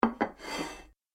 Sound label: Large Dinner Plate Placed Then Slides Across Table; Dishware Sound Effects; Household Sound Effect